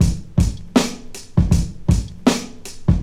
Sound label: 80 bpm hq drum loop e key